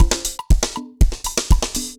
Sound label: dancefloor perc 120 bpm